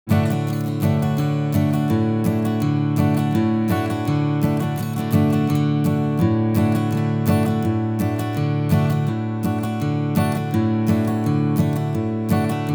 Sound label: Acoustic Martin; BMW Blues EWU